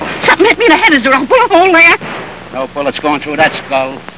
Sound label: bullet